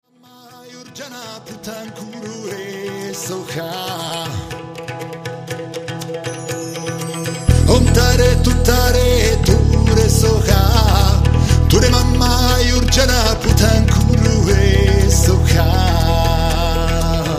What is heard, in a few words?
Om tare tutare